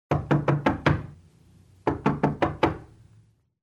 Knocking on door; SOUND EFFECTS1

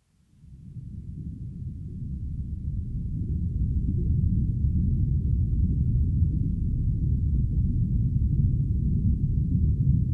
Exploring an Underwater Cave; Dr. Sound Effects; 210 Nature Sounds - 20 Hours of Relaxing Natural Ambiences for Meditation and Sleep

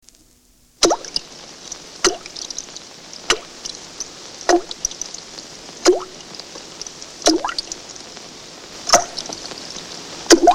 Drips And Splashes; Disney

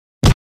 Punch Body Hit With Thud; Fights Bodyfalls

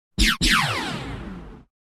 Synth Energy Zaps Double Burst; Electronic Sci-fi

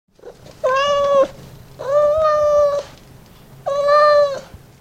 Cat Meow; Birds And Animals

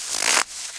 SNOW, FOOTSTEPS; 1000fx

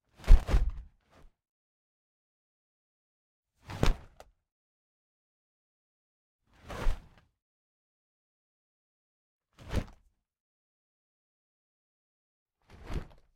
Mid Air Flips; Hollywood Edge Martial Arts and Human Impacts

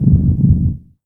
HEART BEAT, synth fx

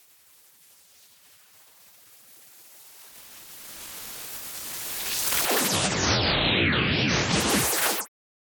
whoosh; Whooshes and sweeps

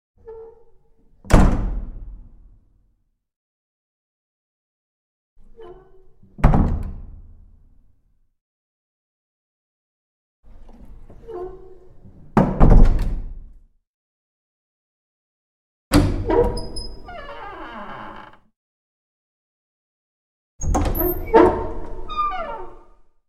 Wooden Door With Slight Squeal; Hollywood Edge Historical Series; cd4 Castles Palaces & Monasteries

